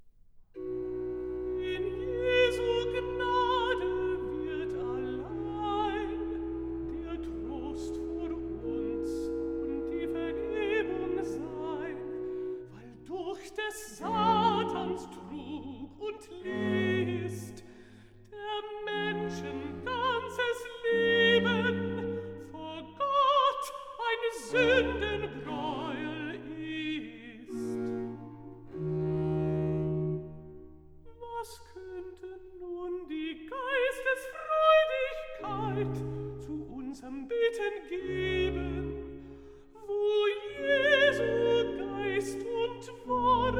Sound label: Cantata Recitativo; Bach; Herreweghe; Johann Sebastian Bach; BWV38 Aus tiefer Not schrei ich zu dir